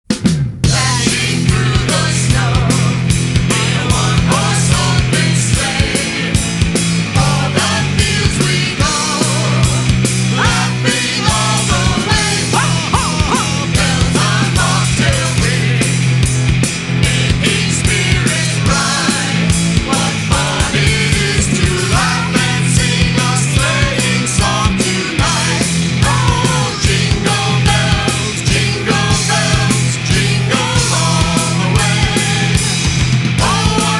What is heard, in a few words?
reprise